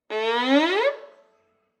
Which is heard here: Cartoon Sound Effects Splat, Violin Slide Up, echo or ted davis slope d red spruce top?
Violin Slide Up